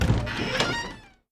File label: door open; p00gie